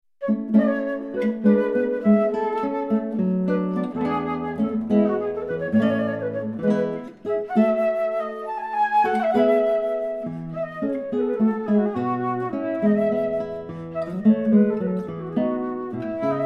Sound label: fanfarinette